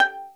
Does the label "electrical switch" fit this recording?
No